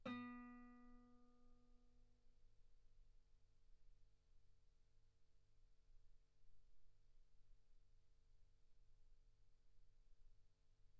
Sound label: Guitar ff sul B B3